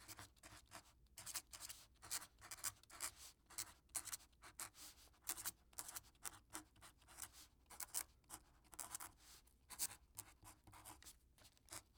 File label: Writing